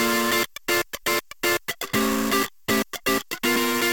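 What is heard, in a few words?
wet guitar funk, Multiband neural audio effect; Demo without amplifier; Wet-NoAmp; prism distortion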